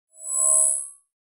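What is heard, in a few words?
HF Electronic Rattle Wash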